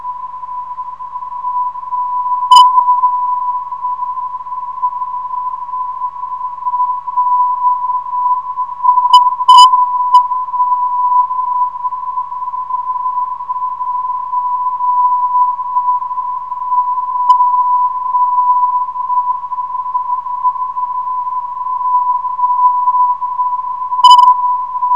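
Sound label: rp 322khz, non-directional beacon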